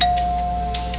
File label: ring in